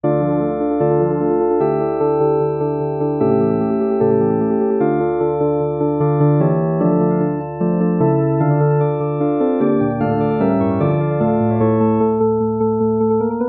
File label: just intonation piano; mozart dies irae recursive